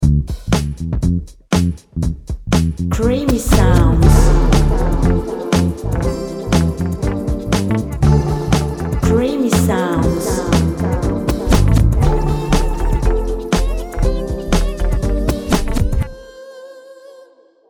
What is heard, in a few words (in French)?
creation musicale urbain rapide